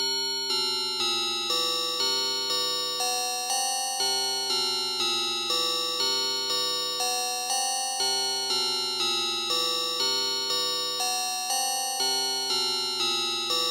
Bell Bell C Ringtone

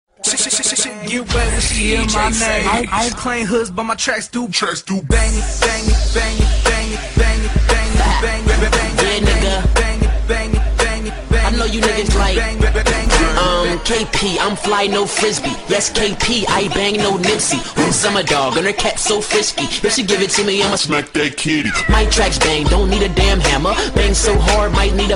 rock bang

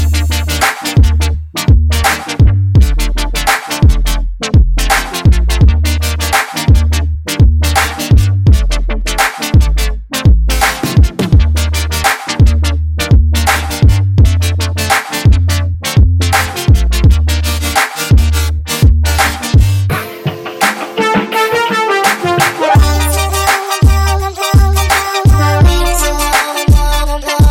TRAP

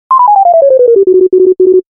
scaling sms

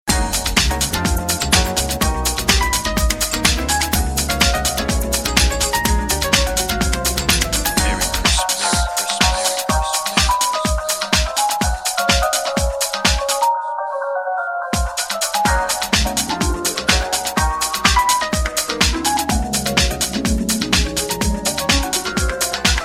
swing christmas; holiday

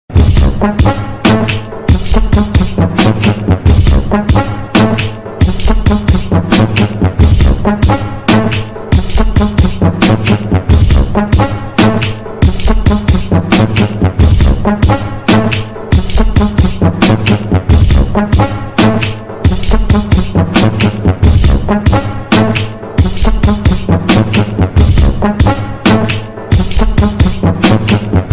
black beat, hip hop

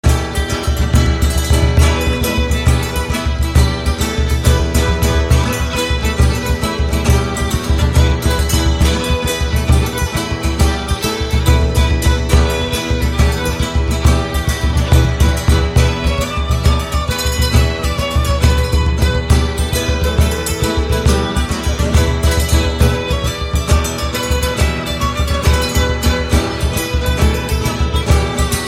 Ireland dance

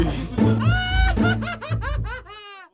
Crazy Laugh

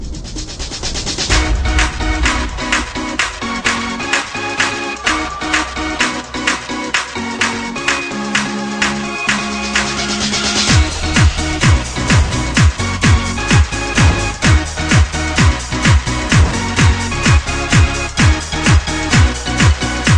DUBSTEP; HTC ONE 2013

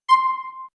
Info Beep; GTA IV

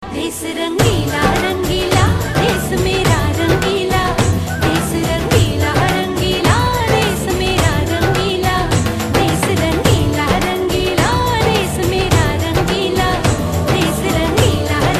country music

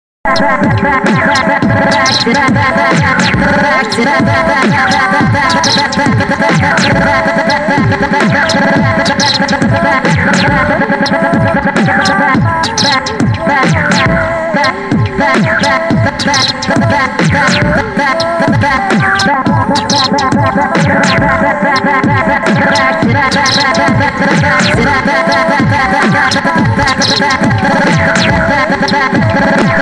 BACKWARD YODEL

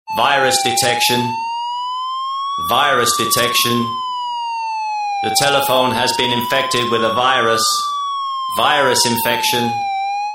virus detection

